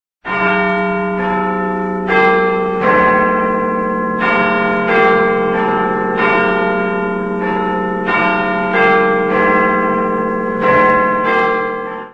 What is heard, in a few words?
big ben ringtone